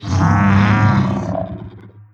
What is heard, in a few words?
dry, strider idle; creatures; combat; vox